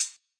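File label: classic hip hop hi-hat; STYLE KITS; Classic Hip Hop Mega Kit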